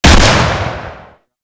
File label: Double Barrel Shotgun Shot; Warframe; Weapons; Tenno